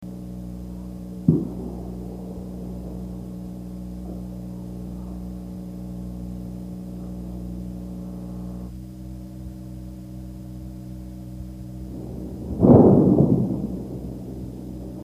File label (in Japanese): jinari; matsushiro; disaster